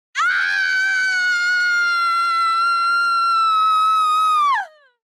donna urla come una pazza effetto sonoro

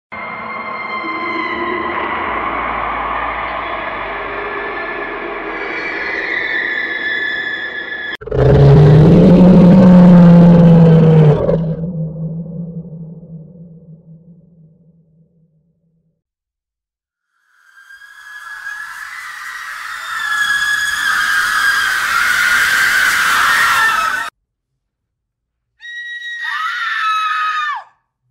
suoni horror generati con ai sound effect hd original scary sound bhoot sound

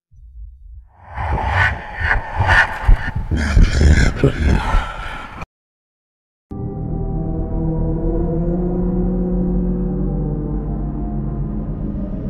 sussurri davvero spaventosi effetti sonori horror sound whisper horror

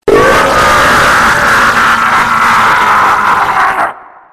urlo spaventoso più bello del mondo effetto sonoro sounds scary

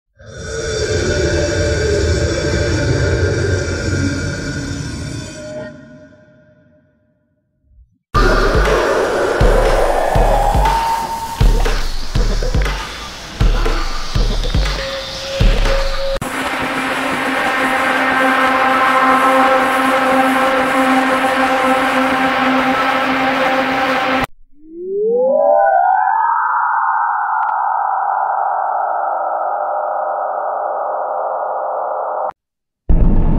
suoni horror effetti sonori da incubo sounds horror scary

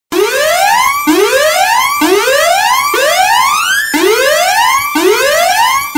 allarme pericolo effetto sonoro; sounds shorts

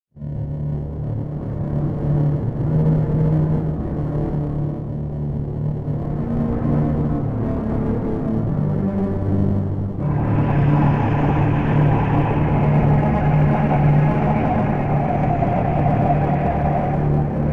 musica inquietante horror sounds music; canzoni horror

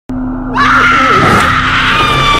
suoni horror urlo terrificante

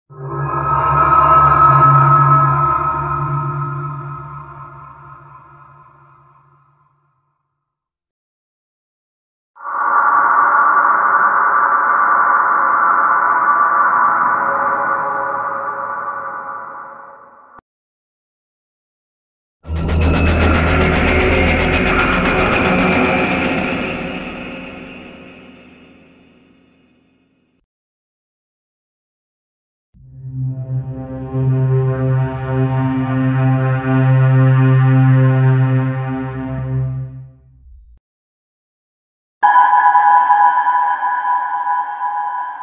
effetti sonori suoni horror ambience horror sound effect

